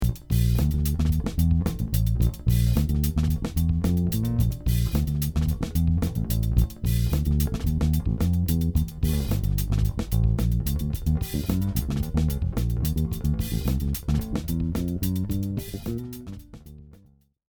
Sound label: sb500s front10 rear0